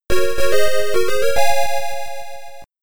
Checkpoint; Buggy Boy; arcade

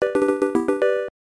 Jingle; pachio kun juuban shoubu; turbografx 16

